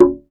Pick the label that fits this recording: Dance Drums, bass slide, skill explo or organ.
Dance Drums